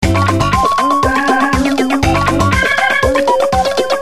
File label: oh no, music minigame; tf2ware ultimate